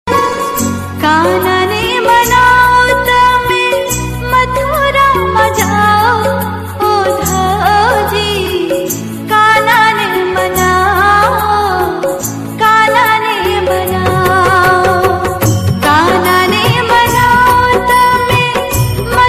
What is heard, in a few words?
Garba